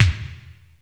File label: Kawai r-50; Drums